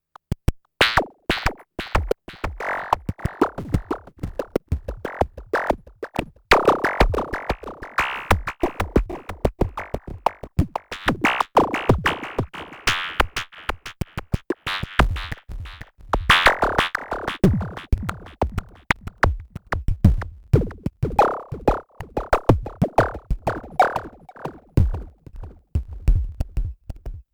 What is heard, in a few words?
one Voice Delay OSC2 Portamento; Gotharman Anamono X mini